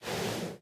马呼吸声

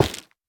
菌柄破坏声

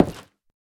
下界木门开关声

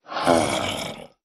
僵尸村民叫声

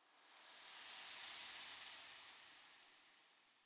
灵魂沙峡谷沙声; ambient

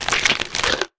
骆驼进食声

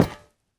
宝库踩踏声

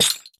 锁链破坏声

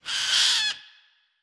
狐狸尖啸声

